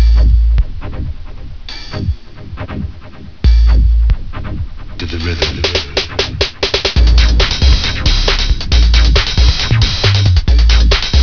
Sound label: sub funk